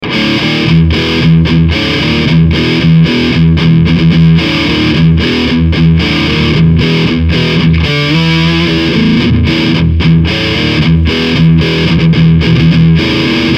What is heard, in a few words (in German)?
Gain 3 Uhr Shape 4 Uhr